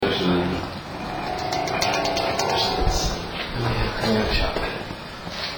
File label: Weird Noise In The Projector Booth